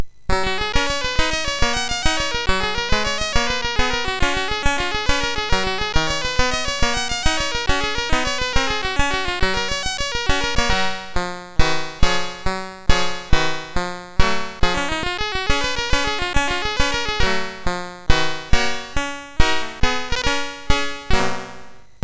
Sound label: sid MOS6581R3